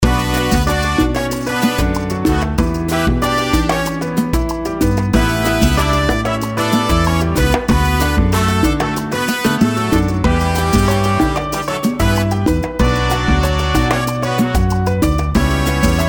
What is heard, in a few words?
Salsa Dancing Song C Brass